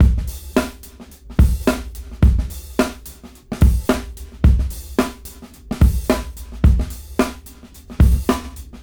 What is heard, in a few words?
Brown 105 BPM; AMG - Keith LeBlanc Phat Butt Jams